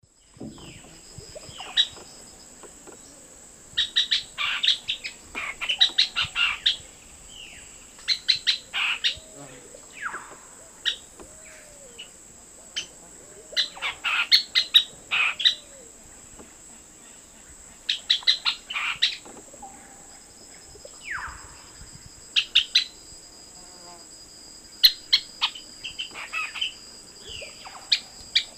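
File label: Laterallus melanophaius, calls playback; S. America; Eastern Lowlands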